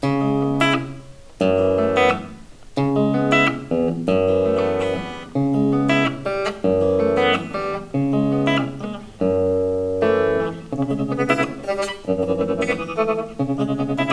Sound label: tremolo